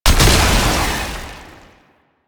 Infested Weakpoint Destroyed; Enemies; Lasrian Tank; Warframe

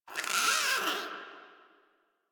Forest Rodent Vocal Angry Short; Characters; Warframe

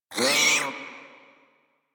Feral Vocal Pain; Warframe; Catbrow

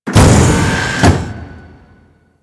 Mach Pump; Grn Mobile Defence; Grineer

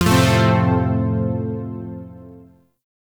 Shawzin Maj Chord G; Chords; Warframe; Zariman Shawzin

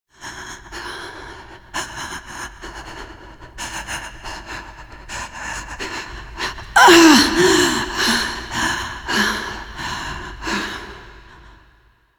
Female Breaths And Drop; Dax; Warframe; The New War; Lua War Room

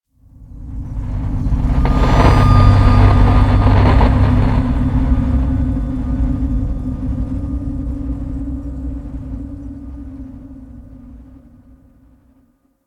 Head Display Static; Cave; Ambience; Warframe; Stalker Cave

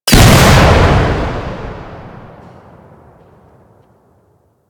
Frozen Barrel Explosion; Explosions; Warframe

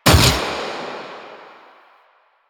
Fire Shot; Duviri; Incarnon Adapters; Incarnon Sybaris Prime; Weapons

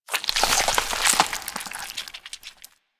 Infested Jetpack Excretion; Warframe

